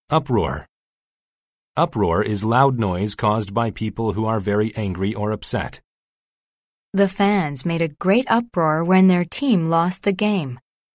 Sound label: uproar